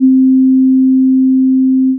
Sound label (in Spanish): sinusoide